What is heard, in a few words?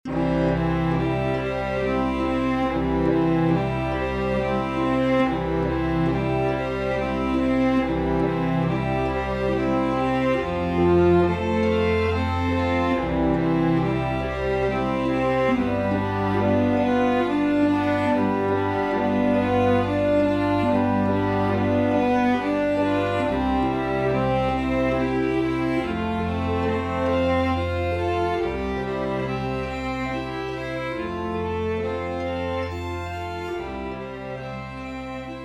Lullaby, Brahms Orchestra